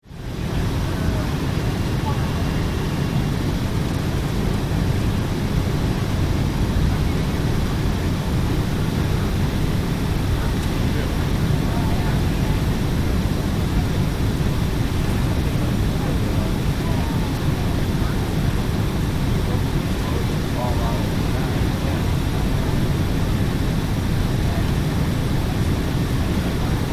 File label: Plane Interior, Transportation, Aircraft, Ambience, Commercial, In, Flight; Airplane; BlastWave